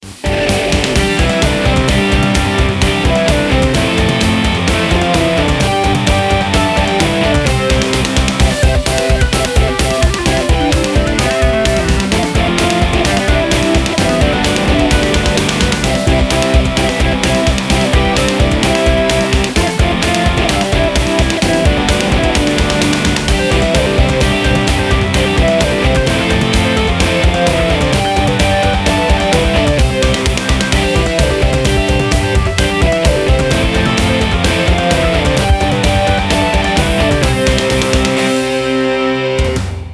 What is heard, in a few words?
rock christmas; ChristmasFurious